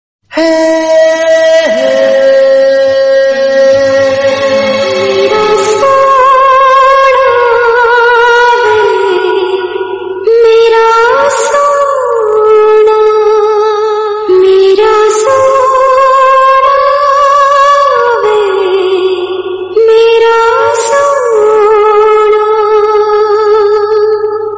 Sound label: Kalimbell hindi